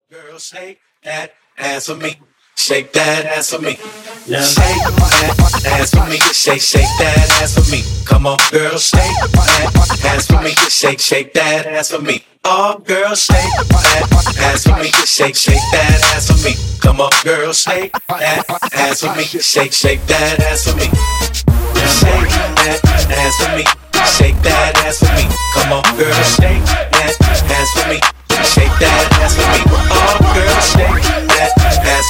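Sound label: Party Breakz Acapella Intro Intrumental 110 BPM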